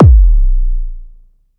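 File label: BD Sub, bass drum; Club; VEC1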